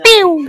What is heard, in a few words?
pew